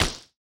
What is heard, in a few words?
hit organic; CrossCode; battle